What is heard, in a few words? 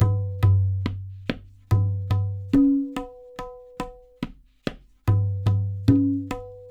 LOOPS, Percussionworks